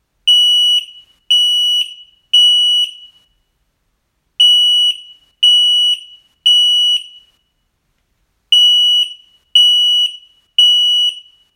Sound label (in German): abus smartvest rauchmelder alarm